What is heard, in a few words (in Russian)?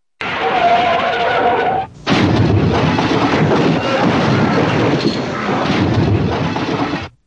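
скольжение шин и сильный грохот от врезания; skolzhenie shin i silnih grohot ot vrezaniya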